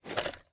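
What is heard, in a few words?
sniper reload empty throw mag; gmod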